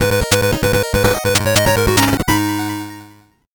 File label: 8bit jingle fast